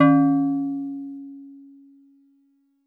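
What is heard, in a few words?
Steel Drums DX100 C#6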